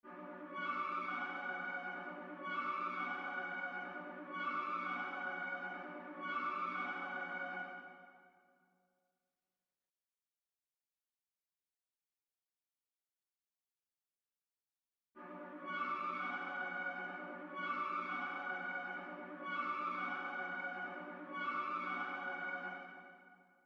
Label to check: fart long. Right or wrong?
Wrong